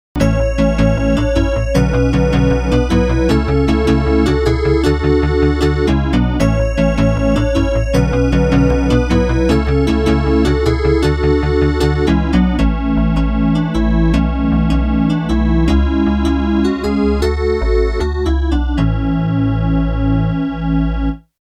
FM DX7 Nappe PPG; nappe imitant un son de l'emblématique PPG Wave 2 (1981)

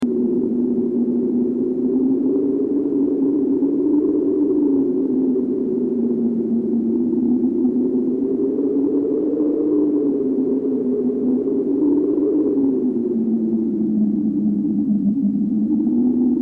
MS-20 vent porte

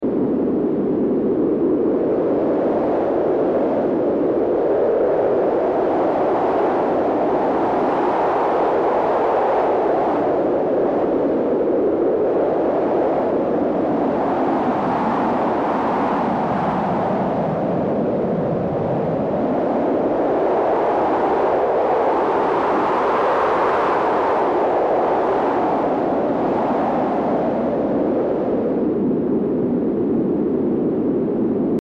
MS-20 vent plein air

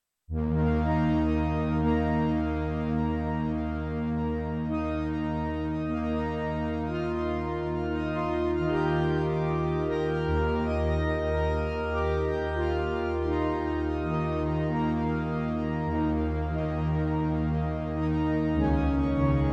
SLEDGE nappe harmo; Sledge : son à deux harmoniques alternées cycliquement, juste avec un simple LFO; Démo audio des fiches didactiques de sons analogiques détaillées dans le livre

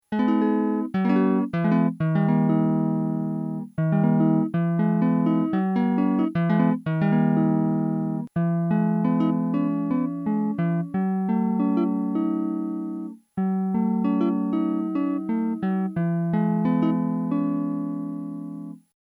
FM DX7 Guitare AC; guitare à corde nylon. Car oui, on peut la FM peut permettre de différencier entre cordes nylon et cordes métal

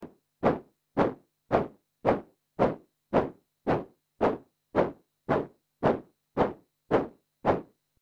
MS-20 bottes; bruit de bottes un peu « oldie »; Démo audio des fiches didactiques de sons analogiques détaillées dans le livre

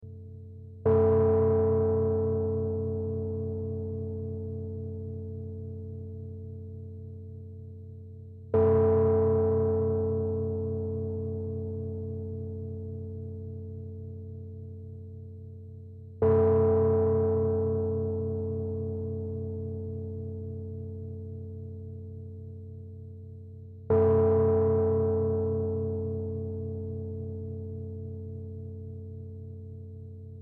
MS-20 cloche; cloche (sans modulateur en anneau, donc bien meilleure, mais si !); MS-20 cloche FM; Démo audio des fiches didactiques de sons analogiques détaillées dans le livre

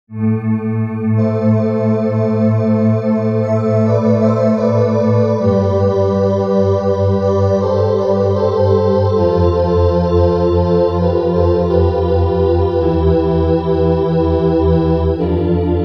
FM DX7 Voix Ether; nappe au timbre vaguement vocal, avec harmoniques typiques de la FM « brute »

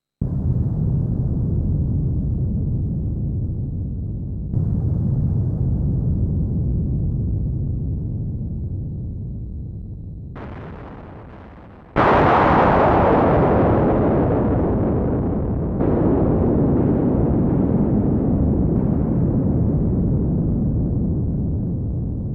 MS-20 orage; orage (un son assez moyen, mais la manière de le déclencher est intéressante); Démo audio des fiches didactiques de sons analogiques détaillées dans le livre

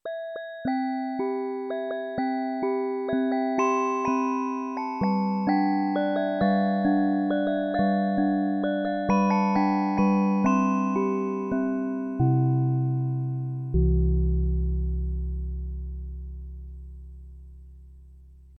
SLEDGE carillon; Sledge : carillon avec un effet de frappe qui change tout; Démo audio des fiches didactiques de sons analogiques détaillées dans le livre

